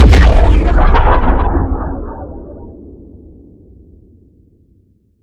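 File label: Sci weapon Shot Large Gun Gravity Turret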